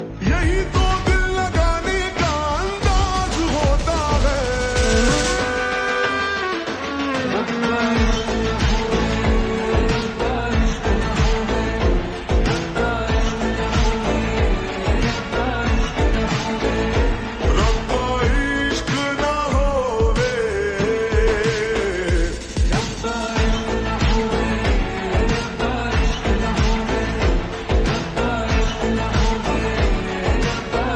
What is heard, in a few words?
Ringtone Slowed Reverb